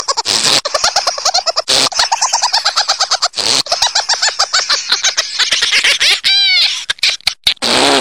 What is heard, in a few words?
Amusing Hop